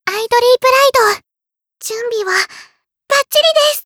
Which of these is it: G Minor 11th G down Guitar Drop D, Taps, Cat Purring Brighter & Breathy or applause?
applause